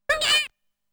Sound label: Flattened; Namco Game Sound Express Vol.1; Valkyrie no Densetsu